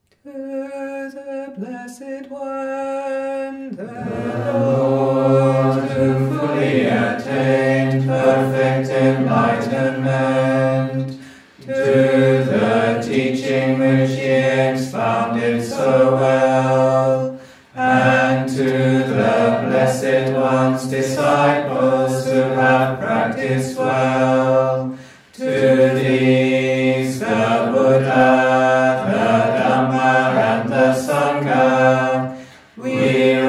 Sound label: Evening Chanting in English; Amaravati Sangha